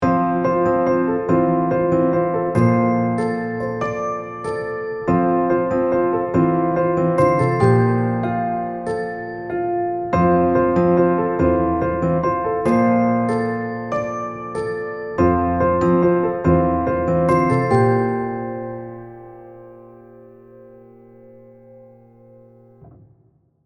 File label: sentimental loving uplifting; Jamie Coleman, Film Composer